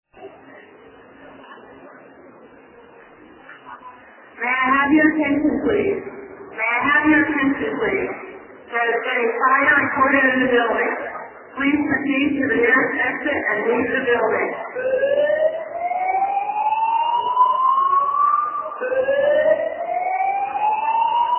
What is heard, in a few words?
Fire Alarm